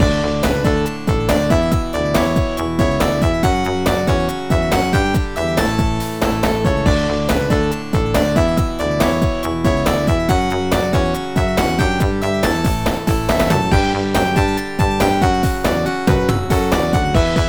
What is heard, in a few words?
New Game Loop; ravva and the phantom library